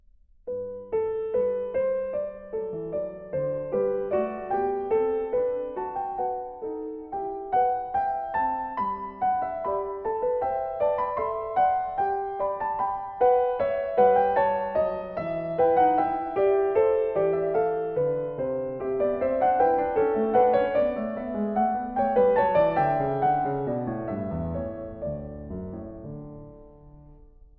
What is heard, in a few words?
Variations, for keyboard